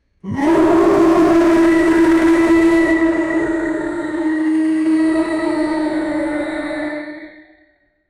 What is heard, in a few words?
ctulhus roar after injury; fantasy